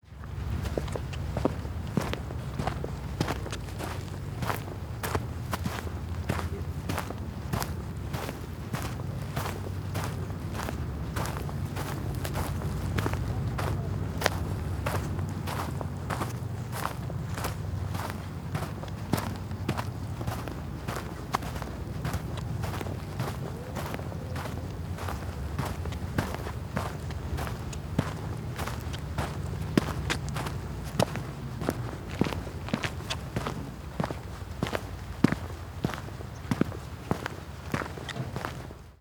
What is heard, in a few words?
Walking On Dirt With Heavy Boots And Wind